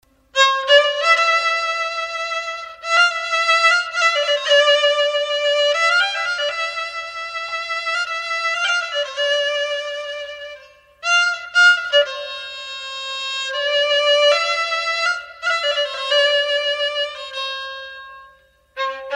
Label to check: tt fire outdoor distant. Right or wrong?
Wrong